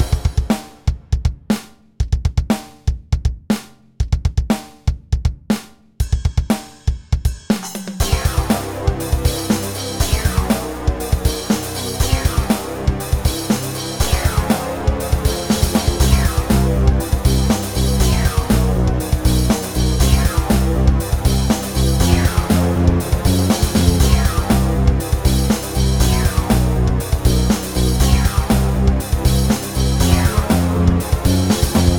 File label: music